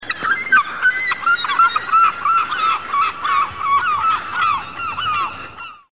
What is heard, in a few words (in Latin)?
Larus hyperboreus